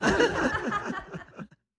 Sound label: studio audience laugh; soundscrate